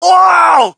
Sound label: crowd, shout; Mike Tyson Heavyweight Boxing